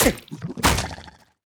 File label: enemy cultist Acolyte death Special; sfx